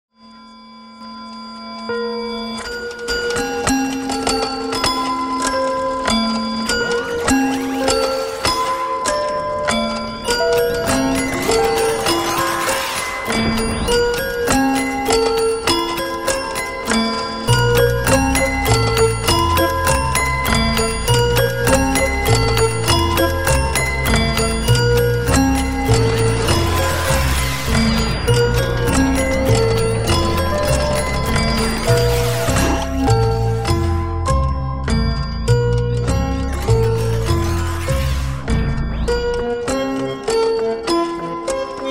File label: Building center toy Piano; The Wedge